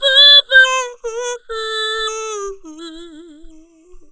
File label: HOUHOUH, ADLIB WOM, Funky Vocals; USB Soundscan vol.18